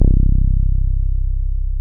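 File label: BAS MKS-50, bass, Roland; Sound & Vision - Gigapack II CD 1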